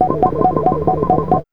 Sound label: SYN-FX